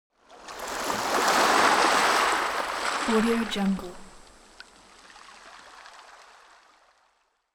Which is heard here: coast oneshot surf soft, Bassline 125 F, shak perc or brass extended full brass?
coast oneshot surf soft